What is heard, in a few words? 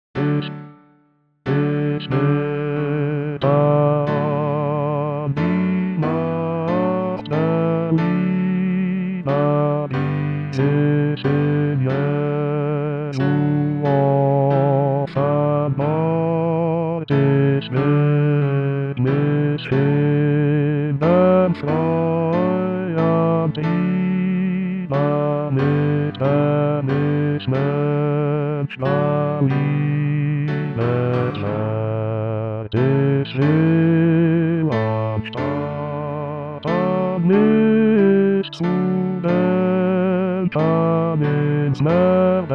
bass; ICH-BETE-AN